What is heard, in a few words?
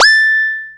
TECHNO; X-Static Goldmine 1